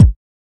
reverb bass drum single shot b key

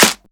sharp clap sound a key; percussion

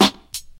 90s snappy acoustic snare sample g key; snare drum samples

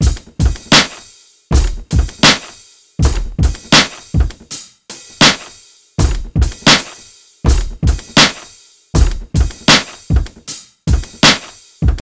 80 bpm drum beat A key; drum loop

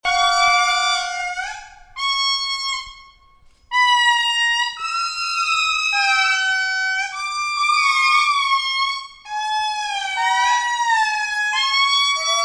lemur indri singing; soundscapes

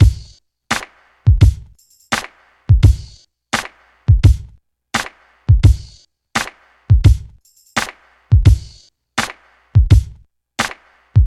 85 bpm breakbeat sample g key

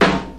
puffy snare one shot D key; snare drum samples

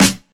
perfect snare single hit g key

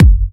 mid range bass drum single hit g sharp key

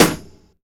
airy snare one shot e key

